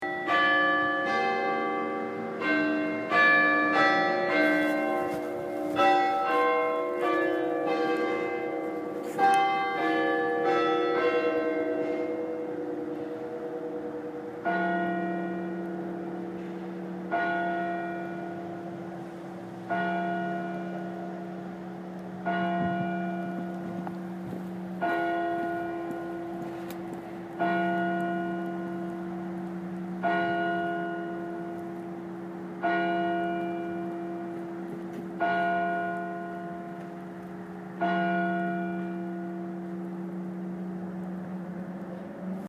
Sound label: St Mary Bells